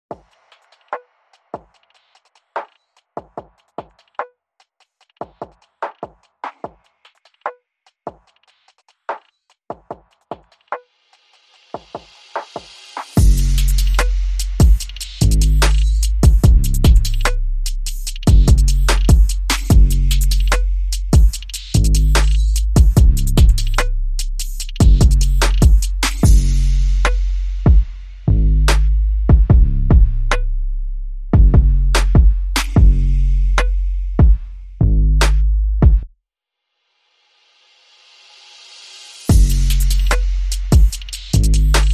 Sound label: Drums Bass and SFX Only